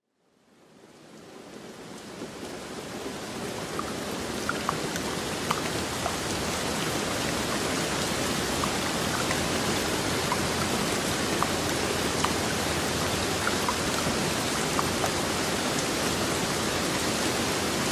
rain sound; باران شدید جنگلی; صدای بارون: بهترین صداهای باران برای مدیتیشن

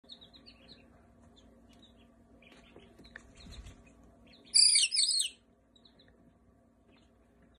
baby rooster; صدای خروس

